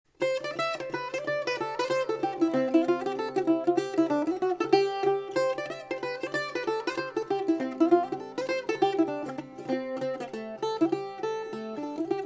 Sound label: Mandola